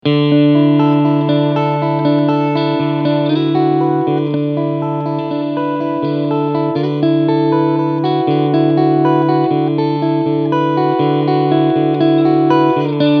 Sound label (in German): Guitars Cleansound beide SCs; Mooer GTRS Guitars Standard 800; Analog